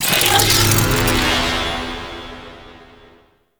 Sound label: Trooper Re Equip Limbs, Foley; Sentient Trooper